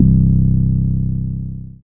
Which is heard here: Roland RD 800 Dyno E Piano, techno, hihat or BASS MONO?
BASS MONO